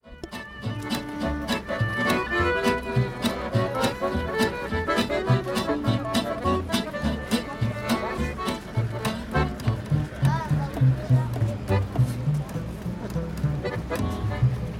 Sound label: French street jazz